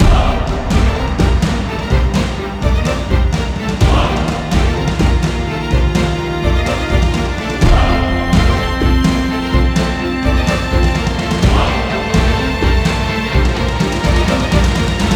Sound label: Fight; heroes of wu lin online online windows gamerip 2008